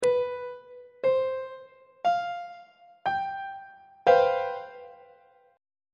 d double flat major 7th suspended 4th 3rd inversion on treble clef